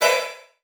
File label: Multimedia Internet CD-Rom Flash Ding